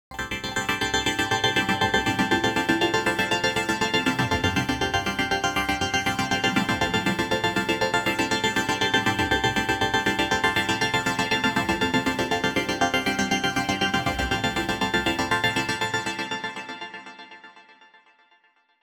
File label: Prophet Rev2; RME